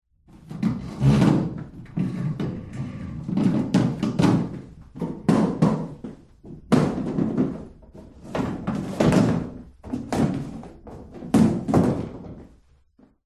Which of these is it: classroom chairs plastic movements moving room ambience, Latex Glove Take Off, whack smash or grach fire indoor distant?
classroom chairs plastic movements moving room ambience